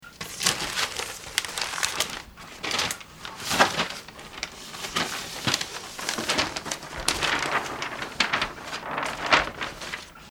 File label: paper